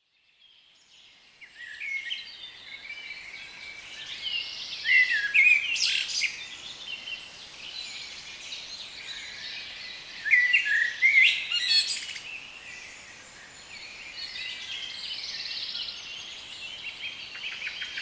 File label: 4K Forest Birdsong